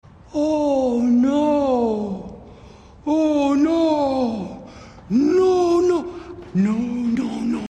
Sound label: otter oh no